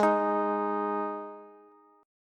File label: Ab 5th Ab Bass; strummed; JGuitar